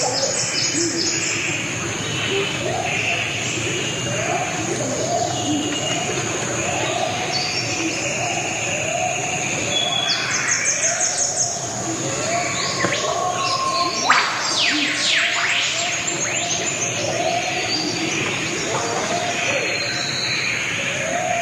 maya forest; medion

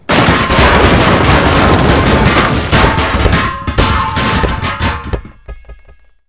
warehouse, ambience

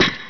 ladder

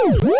pac cherry

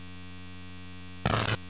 spark; borg

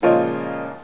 piano

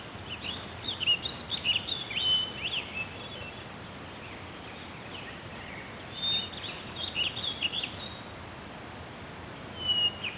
forest

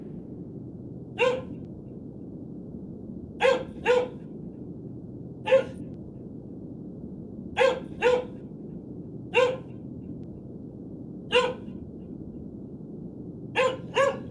dog bark loop

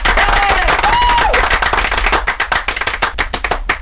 claps

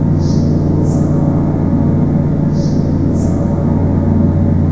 city vent pump loop

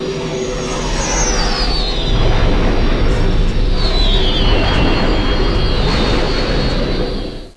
x wing fly by; star wars trench

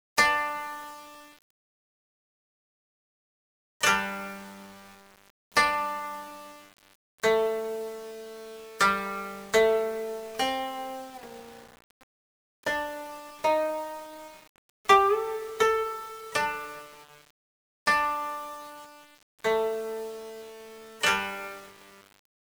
jap musak; kill bill

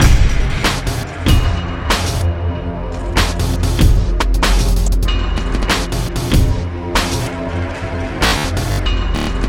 mapend loop, music; morass beta

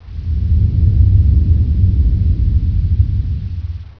dungeon

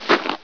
snow

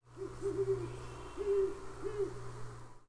horned howl; cs valleywarehouse

